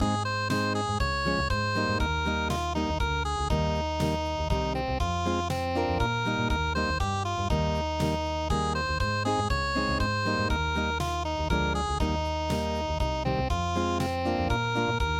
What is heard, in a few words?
unpluged